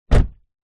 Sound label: vehicles car door close ext close up